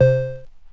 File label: Prodigy Dark Pluck Vinyl Synths C2; vinyl keys